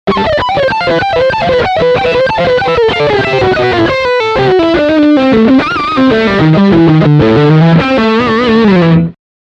Leadline Front humbucker

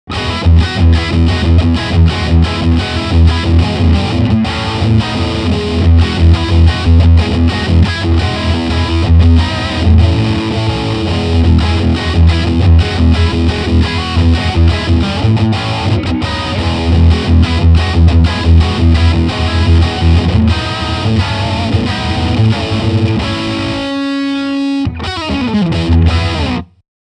High Gain Riff

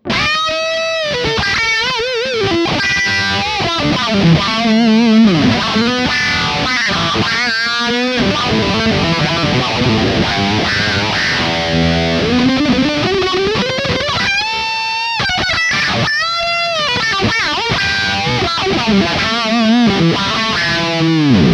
Lead Switch Bright; Auto Quack

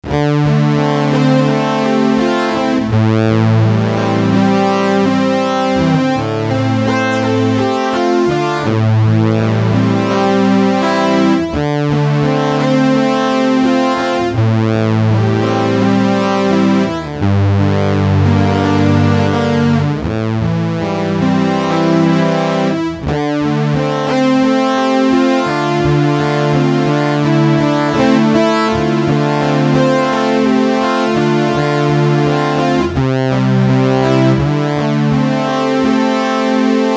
Preset Mini Mood

